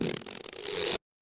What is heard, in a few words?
cartoon stretch